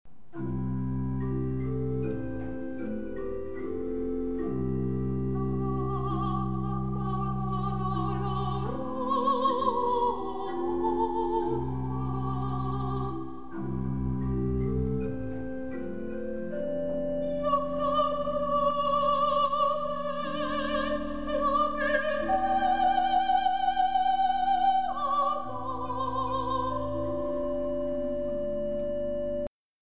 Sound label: stabat mater